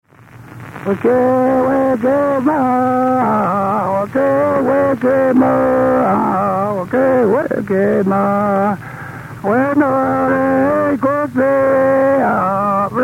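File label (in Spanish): Canto de mujer, Mapuche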